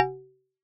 synth

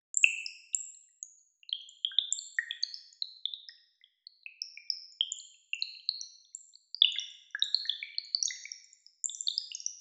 waterdrops

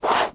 Rubbing Scratching Papier Verre Gros

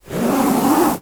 Rubbing Scratching Housse Canap

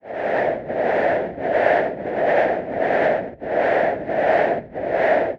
synth Loops

